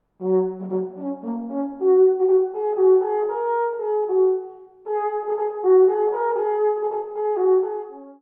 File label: Alphorn